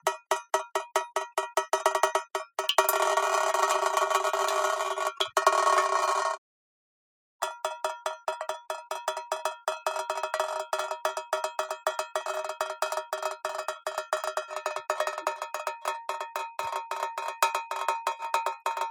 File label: Water Drip Dripping Water On Metal Pot Lid; Mono; Justsoundeffects